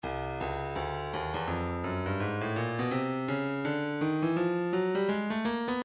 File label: breakbeat 083 bpm; Oppressor B; Stylus Breakbeat Midi Files